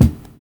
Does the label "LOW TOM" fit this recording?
Yes